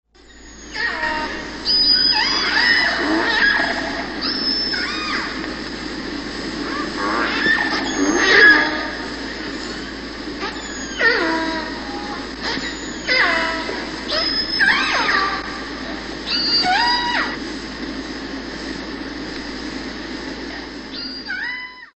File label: Orca song